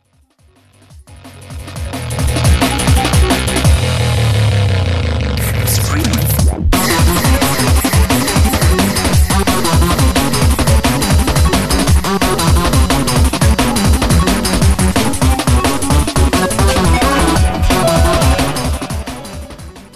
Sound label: remix; sdvx